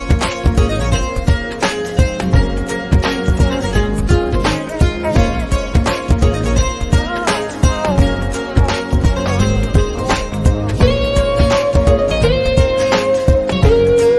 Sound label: Guitar BGM Ringtone